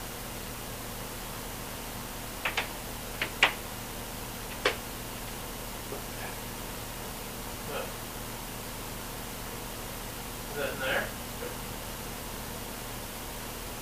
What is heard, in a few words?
Door; Spearfish